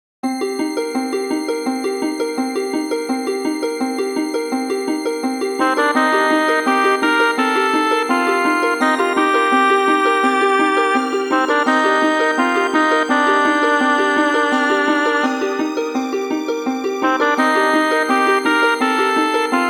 Dungeon; Pokemon Zeta Omicron

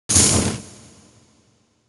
Equip Fire Eggs; Banjo-Tooie

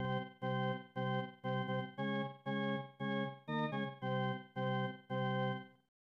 Organ Music; NHL Stanley Cup; SNES

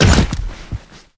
Footsteps; unreal development kit

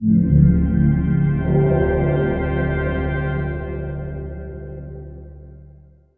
twister sting

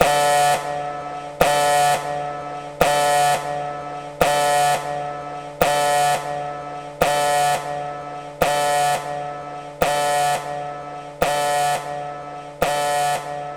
alarm

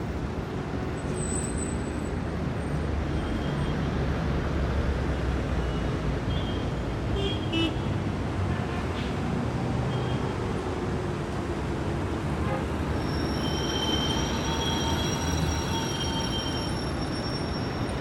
Amb Street Traffic; Beholder 2

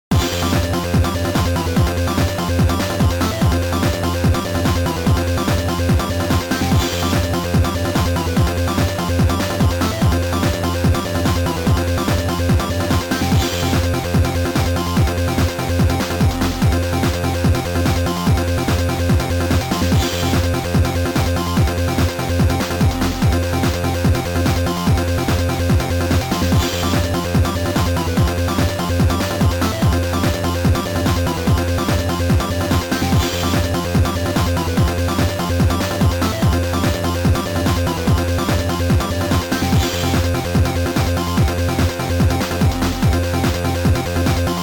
Level Complete; Butt Ugly Martians B.K.M. Battles; GBA

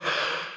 Ghost Ball; Golf Blitz 1.12

